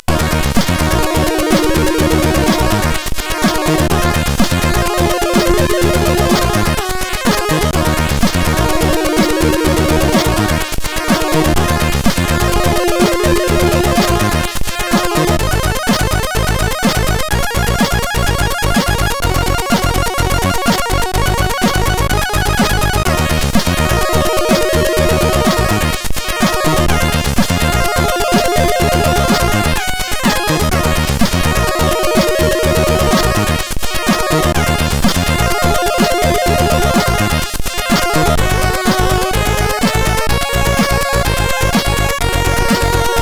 Choir inspired stuff; hvsc

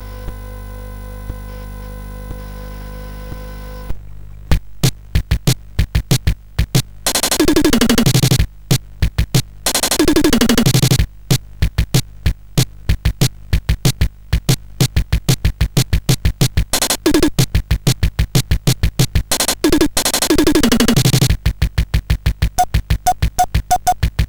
sid CSG8580R5

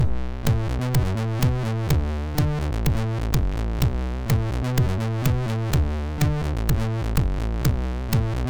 Simple Bassline, MOS6581R2

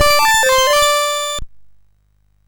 War Cars Construction Kit, MOS6581R3

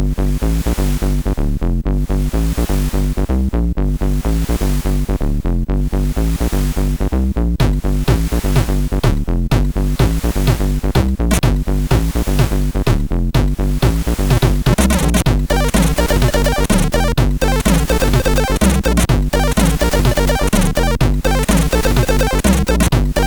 Quite Acid, sid MOS6581R4; hvsc

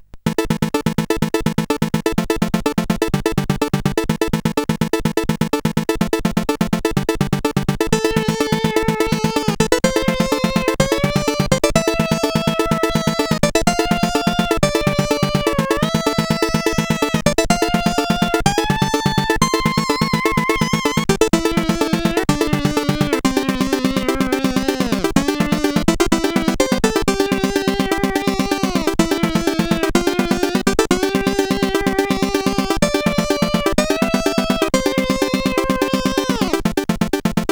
Introtune, CSG8580R5; Censor

